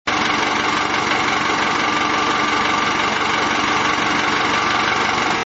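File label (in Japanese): kuruma, car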